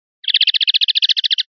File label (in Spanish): rep torreos, pardillo